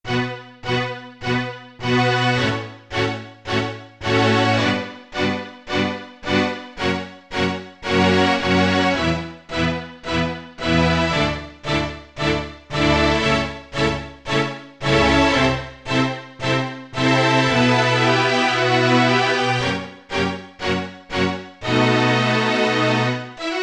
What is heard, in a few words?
Strings